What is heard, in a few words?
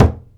BODHRAN; ETHNIC PERC; Instruments & Percussions; InVision Interactive; Lightware VOL-1